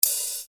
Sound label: Hat, EuroDance